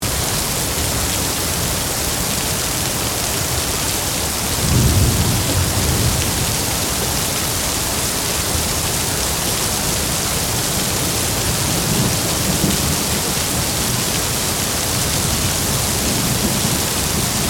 Thunderstorm Heavy, Weather, Thunderstorm, Heavy, Constant, Loop; Thunder Sound Effects; BlastWave